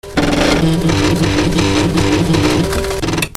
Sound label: OFFICE